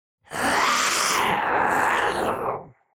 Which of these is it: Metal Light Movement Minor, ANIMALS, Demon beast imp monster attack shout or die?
Demon beast imp monster attack shout